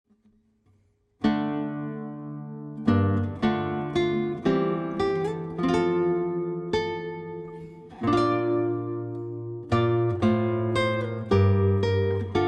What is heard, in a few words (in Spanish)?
Guitarra; Hanacpachap Cussicuinin